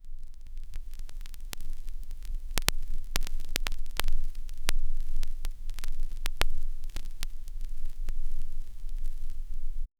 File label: a vinyl record being set